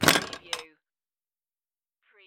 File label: Pinball sound, rattle and clank